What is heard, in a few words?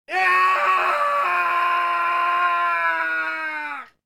Male Scream; shengqishi